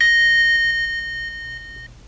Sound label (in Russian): klavisha igrushechnogo pianino nenastroennoe do